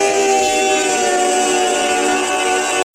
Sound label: jumpscare sound; five nights at robloxs; Memes